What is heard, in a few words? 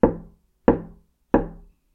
knock on door; knocking